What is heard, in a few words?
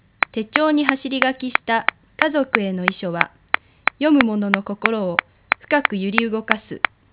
Clap in